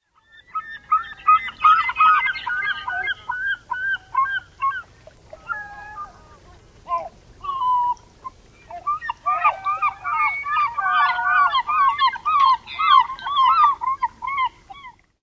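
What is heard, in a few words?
Glaucous Gull more calls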